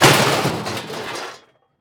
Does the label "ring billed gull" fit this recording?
No